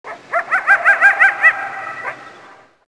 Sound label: coyote